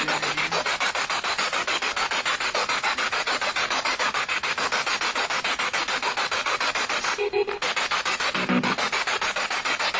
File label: Living Room Spirit Box